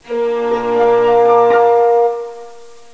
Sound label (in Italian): suonerie classica; musica classical